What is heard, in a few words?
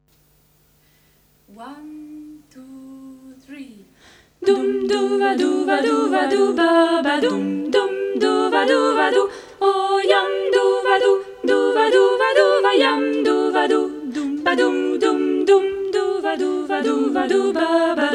Polonaise full